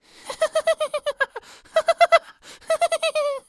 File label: Human Male Laugh Boy Laugh Voice